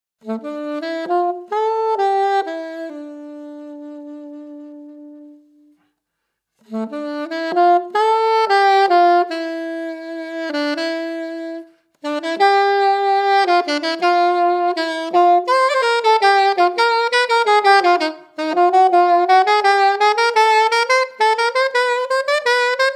Modern Selmer AXOS solo

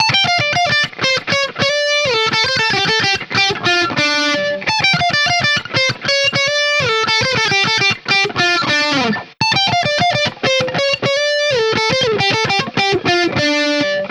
Magneto Lead Bridge Body Neck

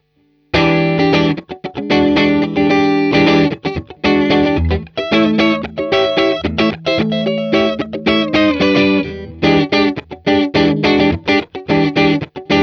70th Funky

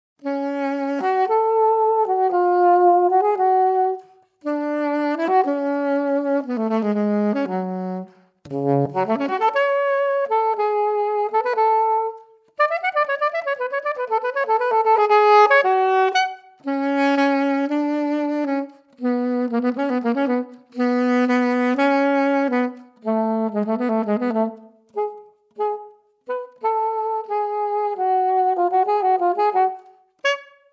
Klassik Yanagisawa A-WO1 solo

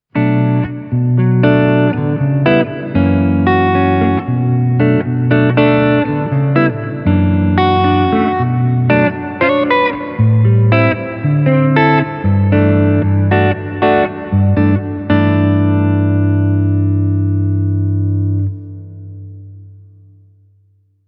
Audio Hall

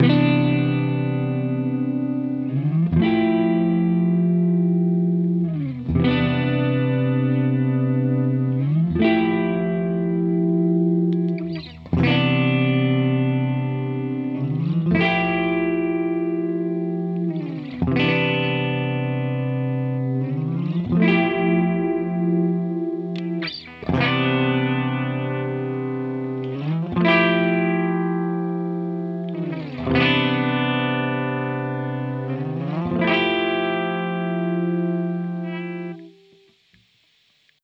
Magneto UW 4300 Clean Neck Body Bridge

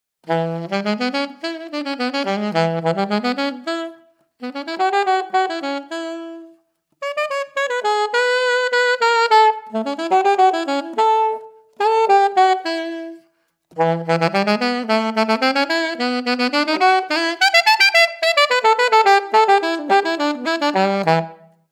Yanagisawa; A-WO1 solo

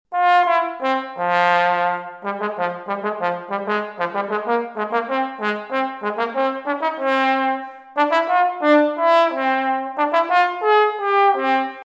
Tenorposaune Bolero open flow

